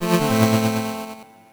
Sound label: exit level